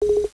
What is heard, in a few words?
Shutter